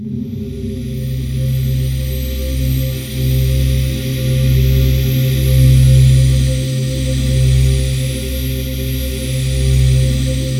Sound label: JV feedback, JV-1080; Syntec; Wall of Sounds